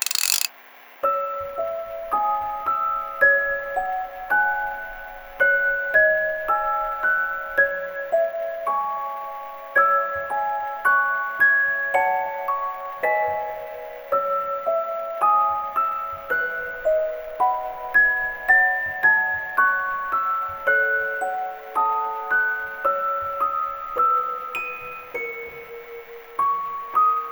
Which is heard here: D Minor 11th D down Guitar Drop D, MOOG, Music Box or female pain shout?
Music Box